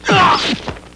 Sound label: stagger; 5element